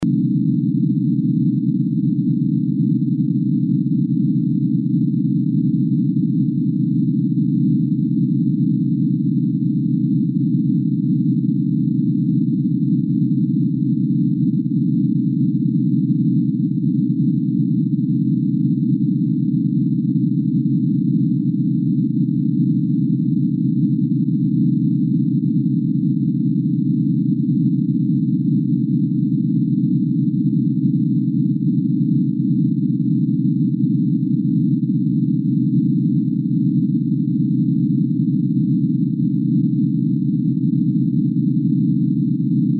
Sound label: First 16 tones Spiricom chart